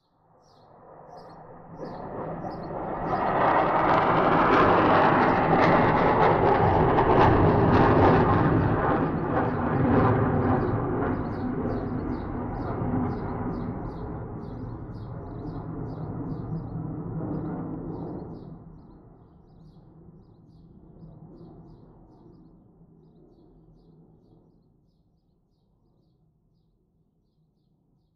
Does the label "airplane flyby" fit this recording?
Yes